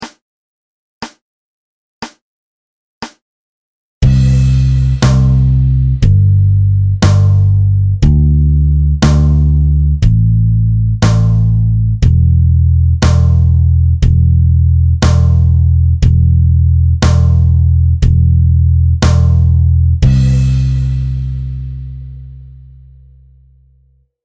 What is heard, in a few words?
practice